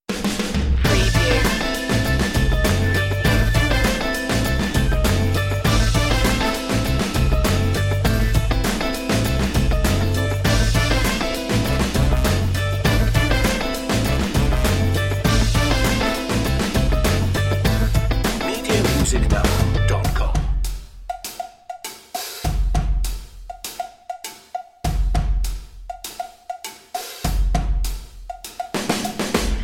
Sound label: funk punch